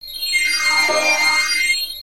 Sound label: bonus symbol win; Lucky Zodiac